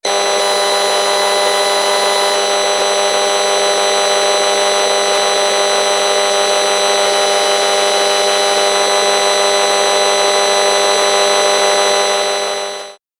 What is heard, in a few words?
Cell Tower LTE 4G